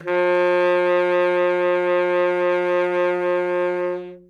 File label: notes f, sax; Dirt-Samples